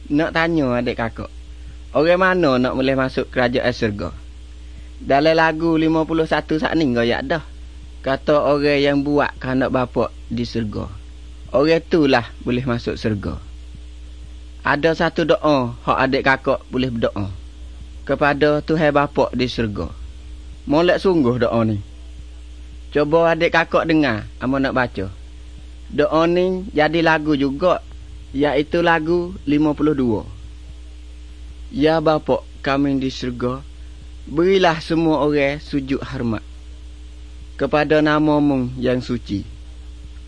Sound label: songs malay pattani